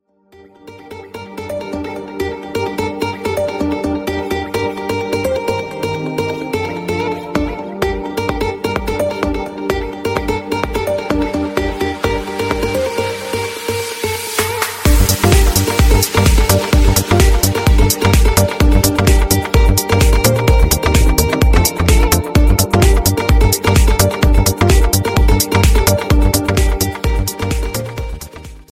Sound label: arabic marimba apple ringtone